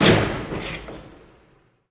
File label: Pilon High Impact